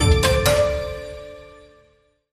bonus counting selected; The Golden City

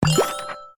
autoplay on; Si Xiang

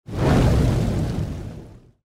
fire circle; Lost Realm

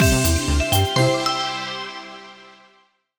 start; Hottest Fruits 40

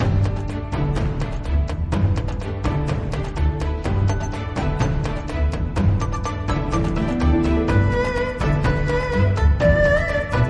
bgm; WaterMarginFishing

